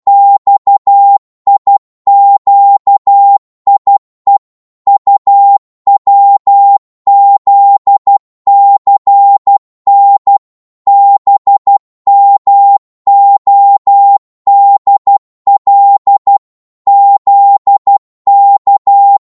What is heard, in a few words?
12wpm, Morse